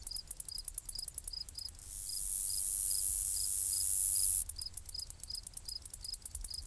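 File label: ambience insects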